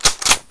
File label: Inf Weapons Foley 3rd; Red Orchestra 41-45